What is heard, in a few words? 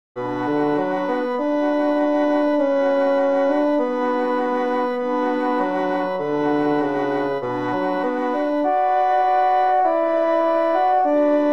woodwind quartet; chopin prelude06; This is No.6 from Chopin's set of 24 preludes each in a different major or minor key.